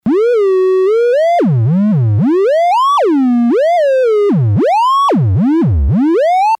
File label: lfo; sm rnd; nord; nord3